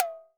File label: TOM H; akai; mpc1000; factorypresetsamples